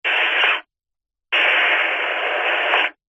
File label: technology cb radio burst noise static